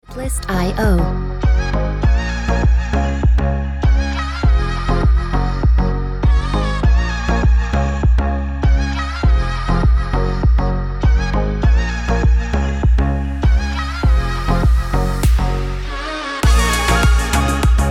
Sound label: Beach Vibes Ringtone